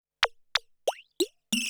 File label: SPACE FX 140; SpaceSounds